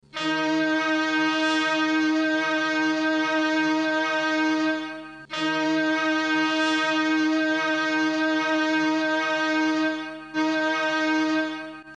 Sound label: Intend to overtake to starboard; colregs